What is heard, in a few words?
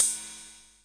Korg KR-55 cym; drum machines